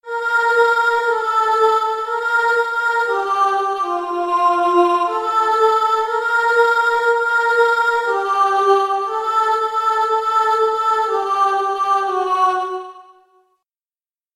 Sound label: alto